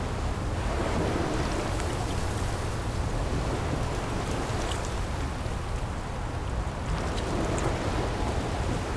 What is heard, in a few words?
Amb Post Port; bloom minigame